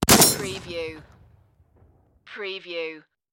Shotgun 12 Gauge Spas-12 Semi Automatic Single Shot Distant Perspective